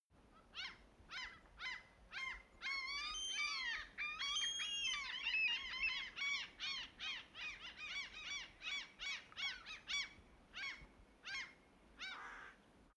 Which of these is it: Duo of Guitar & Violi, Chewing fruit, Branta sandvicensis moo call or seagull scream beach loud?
seagull scream beach loud